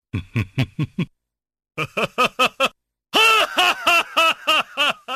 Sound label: laugh; yagami iori